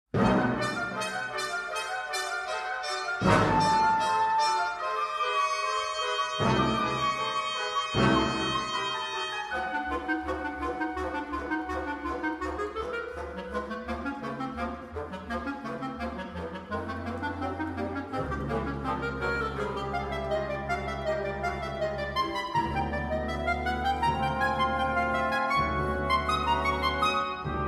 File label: Croatian Chamber Orchestra, Clarinet Concerto; Op. 186